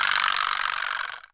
VIBRASL, VIBRASLAPS

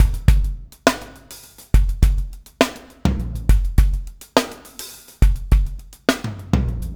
PEAK, Backbeat

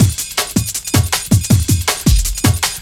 Groove Break; M8

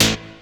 DR. LOOP, BUZZ; X-Static Goldmine 3

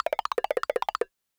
Random Blocks; musicradar; cartoon caper

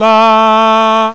BAAA; CMI Sound Library Rev 1.3; HUMANS

